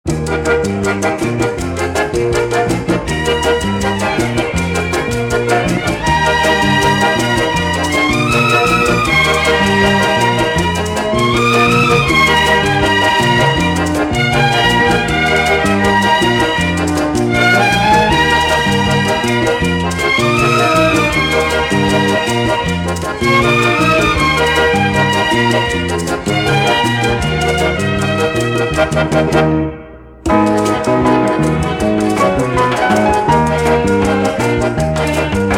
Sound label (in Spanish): instrumental